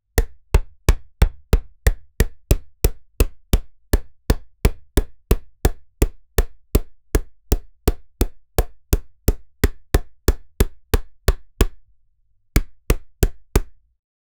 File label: create a violent sound of, impacts